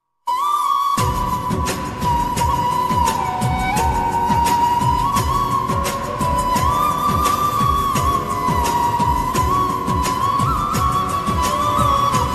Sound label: mungaru male ringtone bgm ringtone; kannada